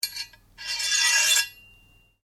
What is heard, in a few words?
Blade Scrape Slow Ring, Sword; Foley sounds for melee weapons; Swords; Combat